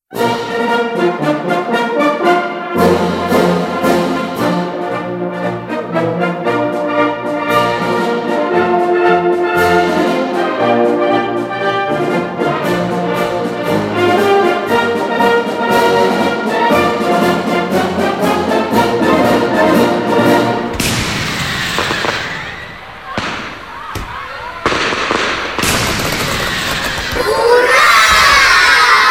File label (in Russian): SALYUT marsh vals polka marsh; Igra na 23 fevralya